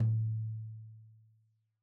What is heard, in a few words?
Tom2 Stereo, Deeply Sampled Drums